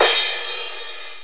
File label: Royality free crash cymbal single shot tuned to the D# note; D sharp key; drum sample